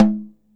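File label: snare drum, Drums Acoustic; USB Soundscan vol.10; AKAI; 90s sample CD; DYNAKIT 2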